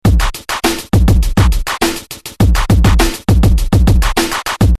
Drum Machine, Loops Musicais; SAPO Scratch

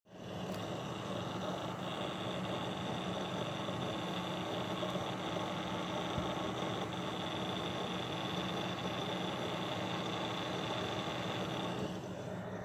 Disco

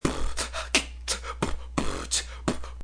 Human Beatbox, Loops Musicais; SAPO Scratch

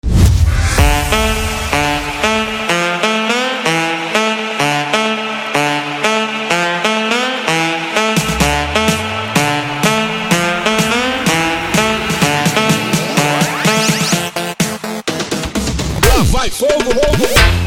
INTRO SAX

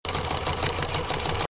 Sewing Machine; Efeitos; SAPO Scratch

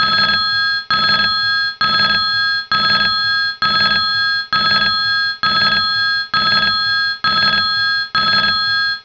ringin Loop

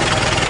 motor

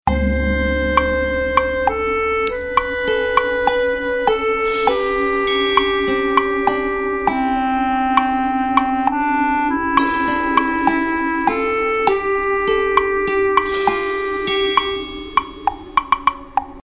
Garden; Loops Musicais; SAPO Scratch

